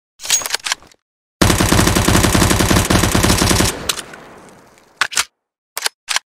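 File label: ump 45, gun; csgo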